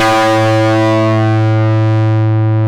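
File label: distorted guitar A1, AKAI S-Series; AKAI S-Series CD-ROM Sound Library VOL-8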